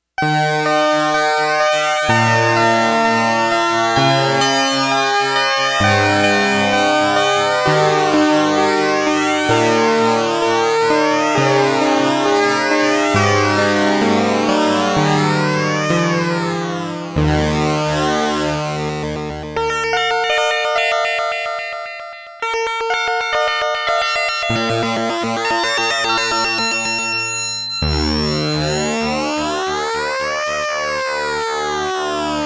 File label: Slow Sync Sweep